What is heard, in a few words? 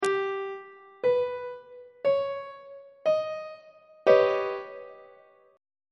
d sharp augmented 7th 1st inversion on treble clef